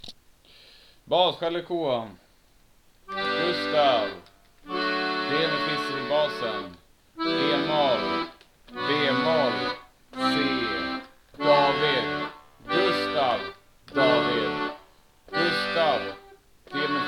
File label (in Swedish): Skällekoa Bas